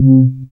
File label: SYN SH, Roland; Sound & Vision - Gigapack I CD 2